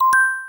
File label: correct